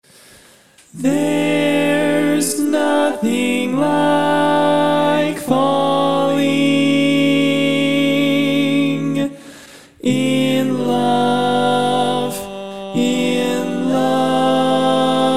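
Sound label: Baritone, Barbershop Tags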